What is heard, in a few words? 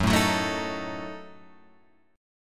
Gb Minor 6th Gb down Guitar; strummed; JGuitar